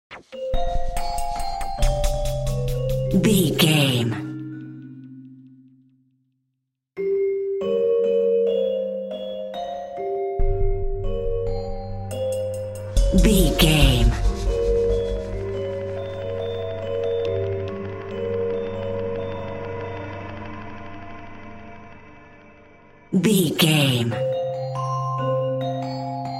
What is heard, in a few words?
mysterious shadowy figure